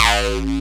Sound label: technosyn2, Techno Trance; Ueberschall; SYNTH